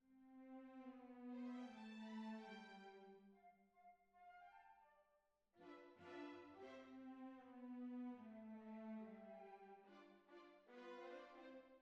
Cbasses

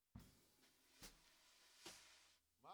SNR

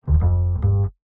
Bass, Jazz for piano

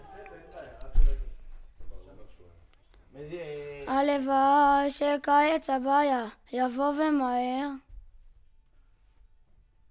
VOC

